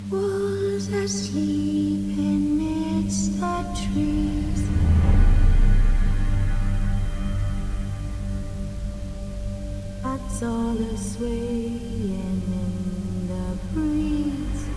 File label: lullaby; deathrun witcher; cstrike